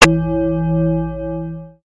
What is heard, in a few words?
Gong; Tagesschau